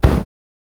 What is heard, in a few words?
fire start; Rust